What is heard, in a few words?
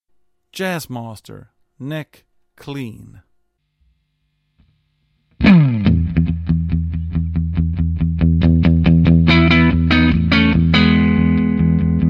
Jazz master Neck 0 dB